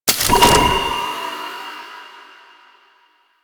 Intel Data Console Extraction; Missions

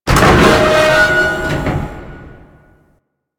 Piston Machine; Ambience; Warframe; Grineer

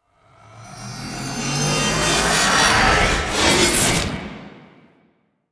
Backstab Hover Blades; Enemies; Warframe; Warframes; Pax Duviricus

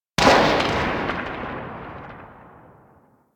Ak Bolto Prime Tail Ext A; Tenno

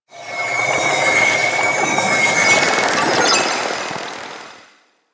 Excavation Scanner Random; Missions

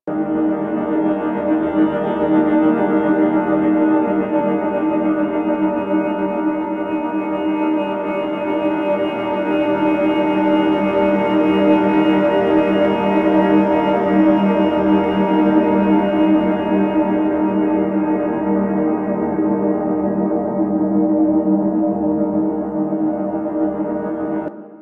Hub Amb, Interior; Warframe; Ambience; Tenno Hub

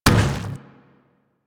Zombie Step Metal, Footsteps, Walk; Warframe; Infested

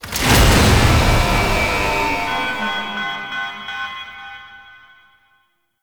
Nokko Visions Elevator Shut Off; Warframe; Nokko Colony

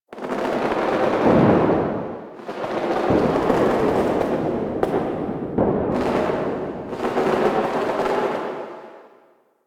Exterior Void Energy Crackle, Ambience; Warframe; Railjack

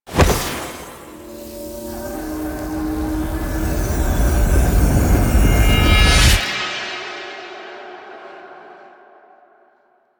Sword Grab; Chimera Lair End; Tenno

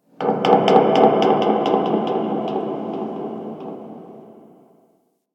Infested Distant Metal Creak, Ambience; Warframe; Corpus Infested

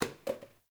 Janitor Paper Towel Drop; Warframe; Ambience; Nineteen Ninety Nine

